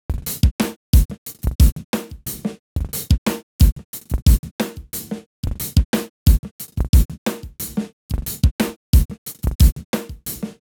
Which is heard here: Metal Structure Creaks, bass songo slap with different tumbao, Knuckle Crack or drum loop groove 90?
drum loop groove 90